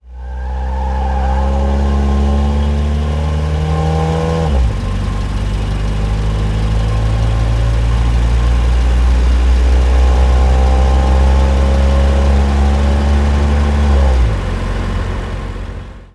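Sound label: car; autko; Mafie 1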